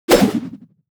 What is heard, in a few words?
throw hard wind woosh